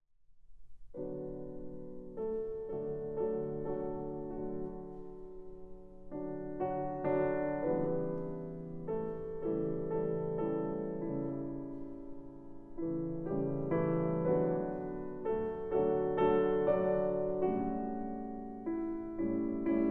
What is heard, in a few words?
piano sonata in a major andante